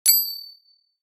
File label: Bell